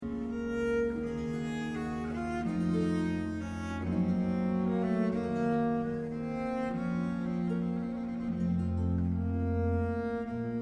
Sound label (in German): Es Ist vollbracht Alto